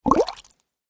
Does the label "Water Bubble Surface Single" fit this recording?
Yes